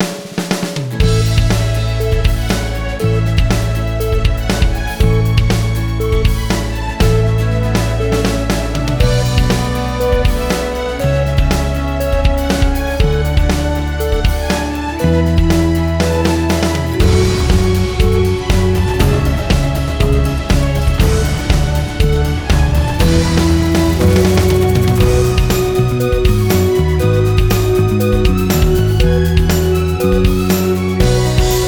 News Groove; Not for Broadcast

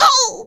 take damage large; Scrat

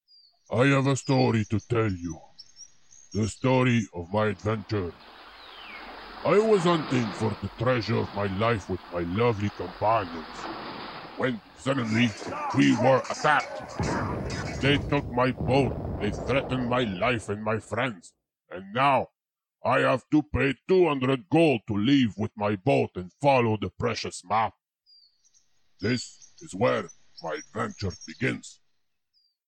story grave; Medieval Fighting Games; android game music

